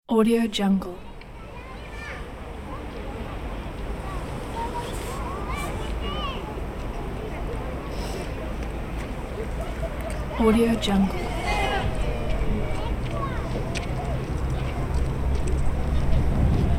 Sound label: park ambience